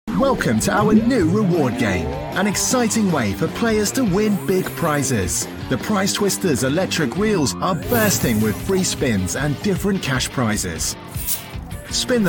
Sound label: twister